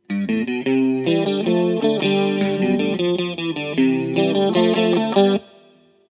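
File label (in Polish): git. elektr., electric guitar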